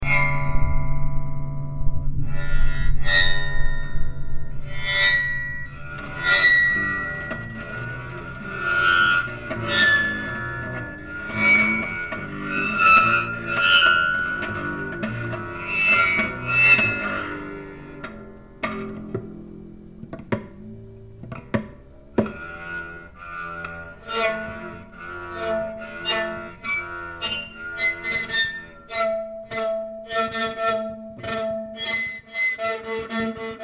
string vibration

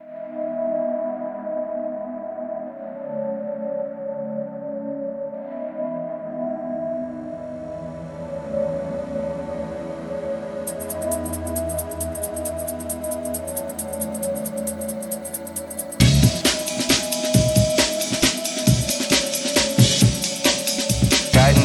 amen waltz

